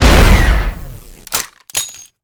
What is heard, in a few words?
Rocket Launcher Shot Eject, Weapons